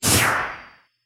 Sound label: Wolf Link Senses Off